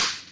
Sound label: Sex Smack; Lewd SFX